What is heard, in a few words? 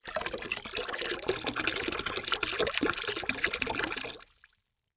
Liquid Water Water Pouring Into Plastic Tub With Water